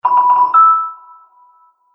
Collect Coins Fast Speed, video game sound effects